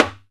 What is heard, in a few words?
Timbales; E-MU Producer Series Vol. 2 – More Studio Essentials; Composer; Timbales Xswitch